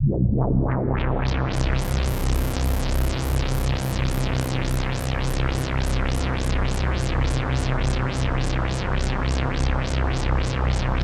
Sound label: 2osc